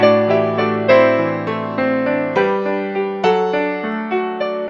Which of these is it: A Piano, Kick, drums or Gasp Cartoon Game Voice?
A Piano